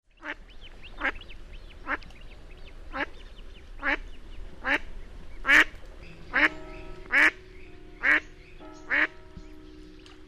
Canard colvert

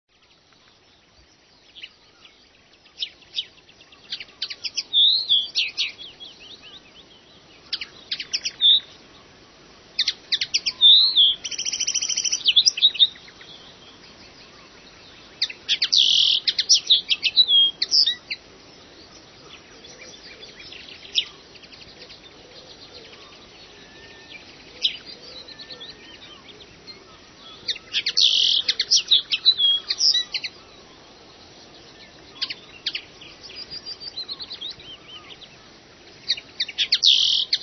linotte

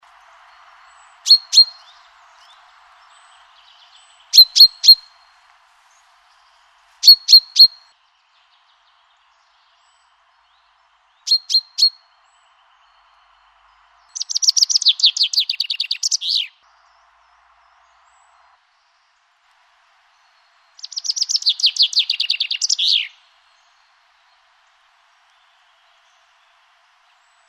Pinson des arbres

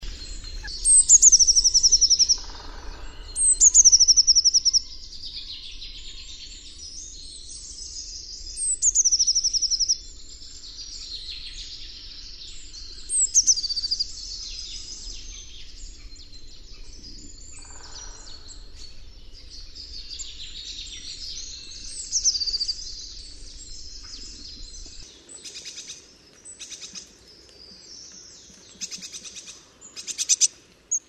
Mesange bleue

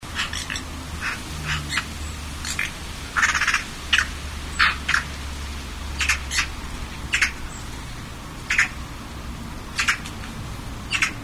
Pie bavarde

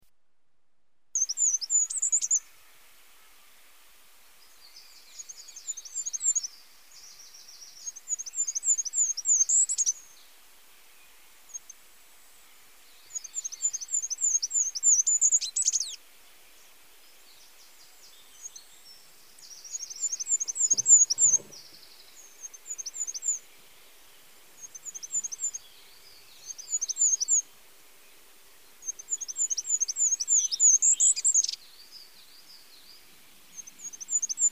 Roitelet huppe

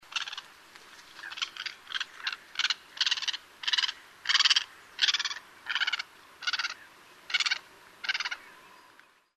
Grande aigrette

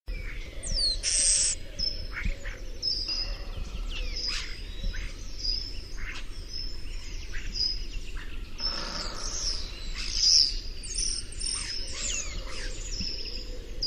Etourneau

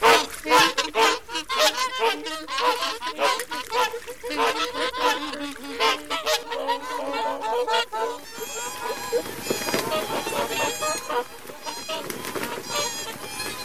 cygnes

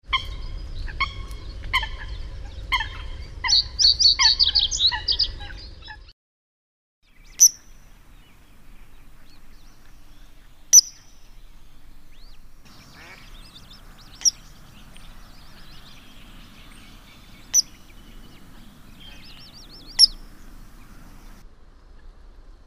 Foulque

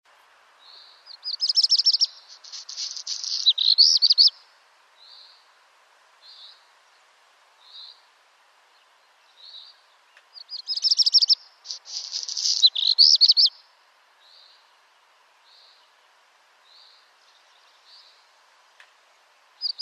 Rouge queue noir

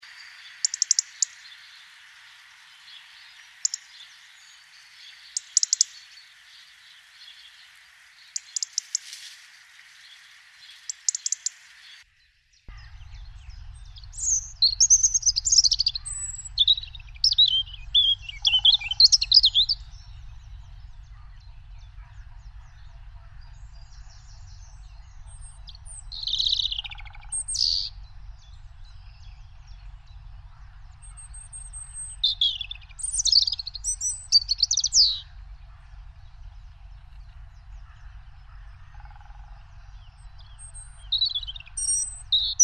Rouge gorge

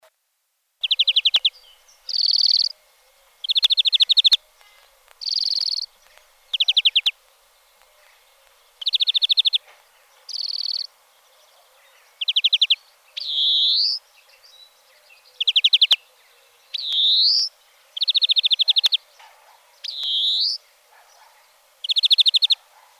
Verdier

